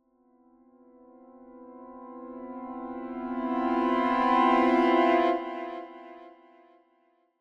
Symphonic Strings Suspense Chord - Increasing - Higher; Hollywood Edge; sound designer's toolkit v2